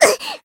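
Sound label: damaged, Japanese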